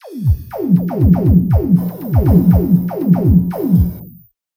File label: drum mix